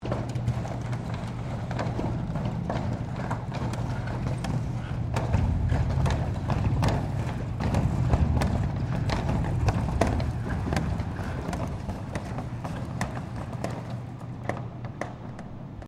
Door Rollerdoor Large Supermarket Opening Stereo, Large supermarket roller door; Miscellaneous Doors